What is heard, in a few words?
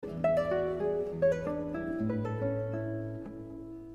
Gran vals, Nokia